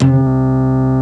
Dbl Bass C5, Fairlight CMI IIe

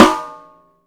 POWR SD, POWERDR; AKAI S6000 CD-ROM - Volume 1; DRUM RYTHM

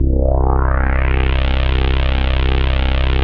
JX8P BASSICS, Vintage Keyboards; Big Fish Audio - First Call Vintage Keyboards

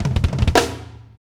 Fills; AMG; Now CD-ROM (Roland)

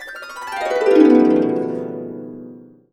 B, Harps; USB Soundscan vol.62 - Complete Harps; AKAI

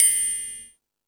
Space Drums, E-MU Pro-Cussion; drum machine

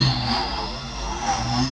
Fx Vox; Equipped Music; Loungin House

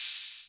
OH 808, HAT-S TR 808; Masterbits; Soniq Elements

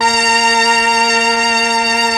STR, M400; ILIO; Propeller Island Legendary

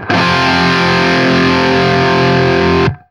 open F, open chords, guitar; East West; Steve Stevens Guitar Samples Collection

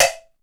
DYNA PERC; Crypton - DrumBase5000; DYNA KITS

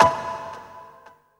PERC FX, Dance Drums

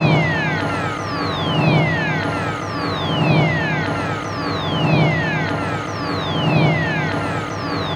Crude Tape Loop, Tape delay; radiosonics

